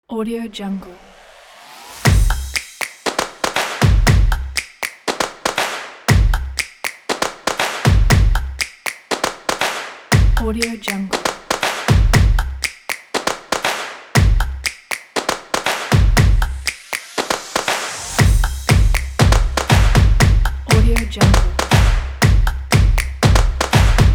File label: this is claps